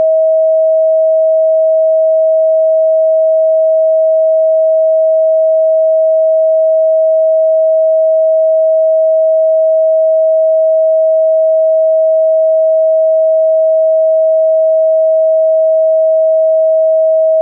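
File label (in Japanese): 639 Hz, ソルフェジオ周波数 信号音; 第4チャクラ 緑; 人とのつながり、関係の修復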